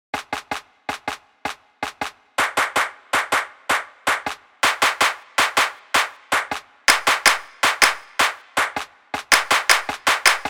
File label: new clapping music